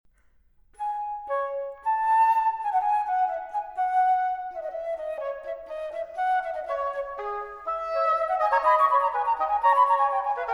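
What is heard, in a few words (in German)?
Trio 11, d-Moll für Flauto traverso, Oboe & B. c.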